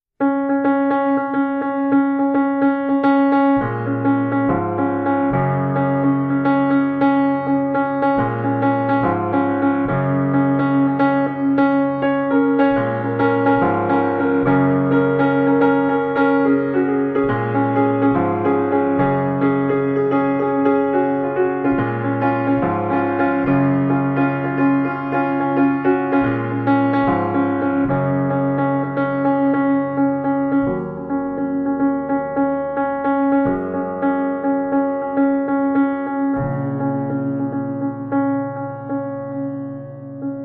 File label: Piano